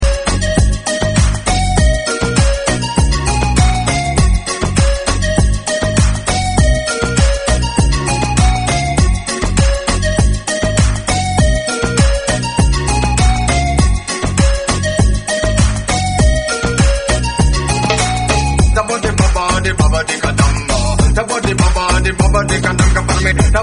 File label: extended clubmix